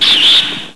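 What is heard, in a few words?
squeak, rat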